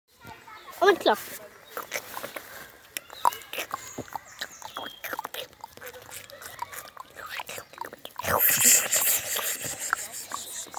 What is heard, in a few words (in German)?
Muenderklappern